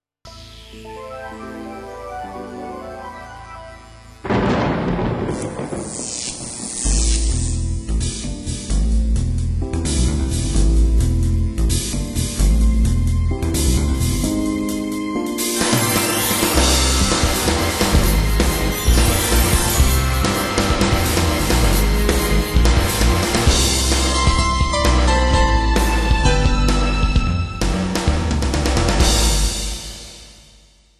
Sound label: midi; carracer